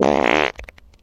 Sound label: fart medium